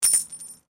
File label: Coin Penny Multiple Drop Stone